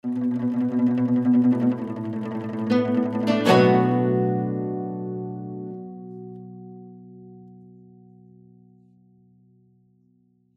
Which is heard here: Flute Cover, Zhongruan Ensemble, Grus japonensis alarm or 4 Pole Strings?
Zhongruan Ensemble